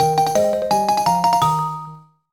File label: Obtained a Key Item!